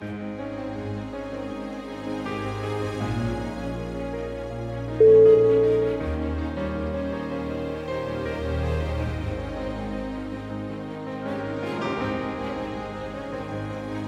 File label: in romantic mood